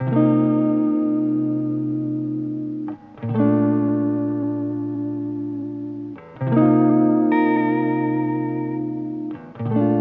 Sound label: Electric Guitar Wet - 75 BPM A Maj; Melodics; Cymatics; Eternity Sample Pack; Guitars - Electric